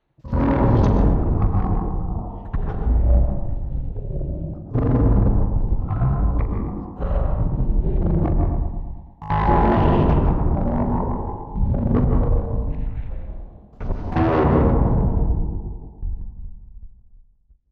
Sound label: Zombies Rising